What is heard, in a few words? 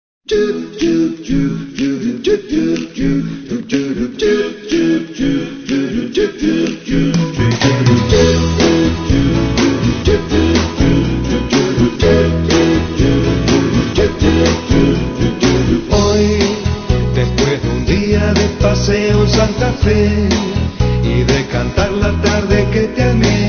beat